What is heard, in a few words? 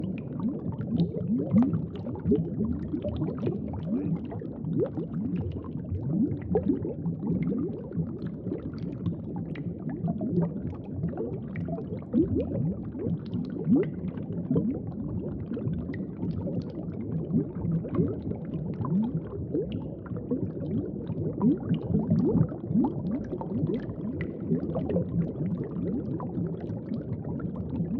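ambience underwater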